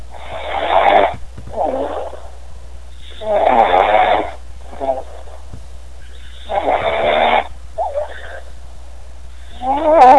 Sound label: snoring